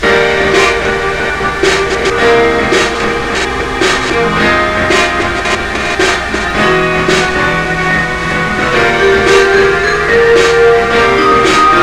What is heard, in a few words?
Mall Muzak; Postal 2 Paradise Lost